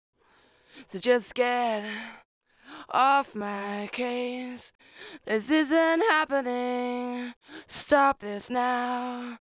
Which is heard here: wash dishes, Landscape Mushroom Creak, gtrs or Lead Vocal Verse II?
Lead Vocal Verse II